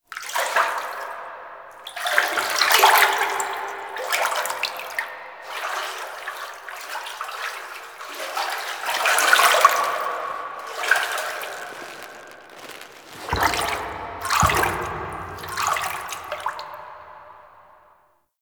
Foley; Warframe; Tau; Cinematics

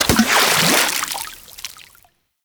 Fishing Spear Water Impact; Weapons; Warframe; Eidolon Fishing Spears

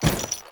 Generic Footstep; Warframe; Temple; Foley

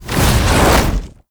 Movement Dash; Warframe; Uriel

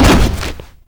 Spin Attack End; Warframe; Veilbreaker; Sprag

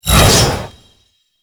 Hammer Glass Whoosh

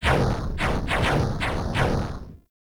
Background Explosions; Warframe; Caliber Chicks; minigame

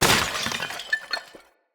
Vase Clay Medium Break; Vases

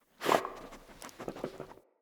Battle Dog Sniff; Animals; Vocals; Snc Battle Dog

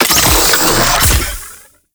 Med Station Activate; Warframe; Lasrian Soldiers; Weapons

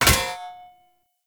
Short Sword Medium Parry Impact, Weapon Impacts

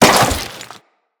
Crewman Step Flesh, Footsteps; Enemies; Corpus

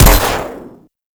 Electro Mag Trigger Explode; Warframe; Weapons; Corpus

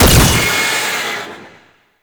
Fist Claw Spike Spawn; Weapons; Warframe; Tenno; Incarnon

